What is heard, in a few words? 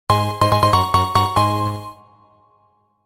strum; trovato